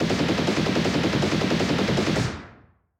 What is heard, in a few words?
machinegun